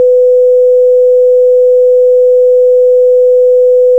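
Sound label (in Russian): синтезатор одиночный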